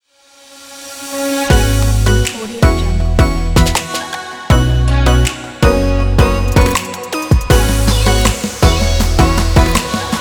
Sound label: future bass intro logo